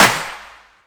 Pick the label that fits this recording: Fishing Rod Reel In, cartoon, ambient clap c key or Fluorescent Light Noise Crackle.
ambient clap c key